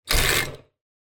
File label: transport bicycle childs peddling short burst